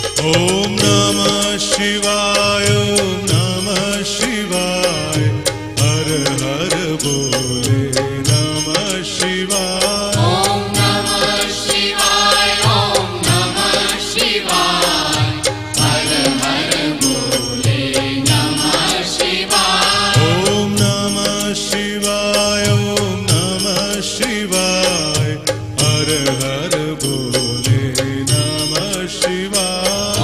om namah shivaya om namah shivaya har har bhole namah shivaya slow version ringtone